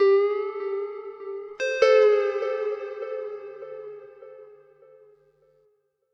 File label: Electric Guitar Clean Wah Melody; MatiasMacSD; Retrace